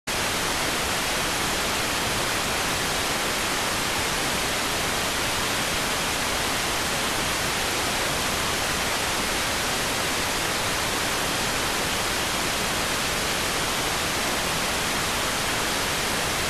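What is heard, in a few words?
radioteletype receive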